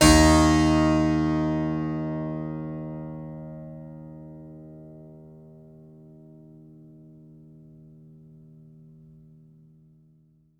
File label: D#3, Synclavier keys and guitars; Sampler Library